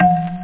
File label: knock; drums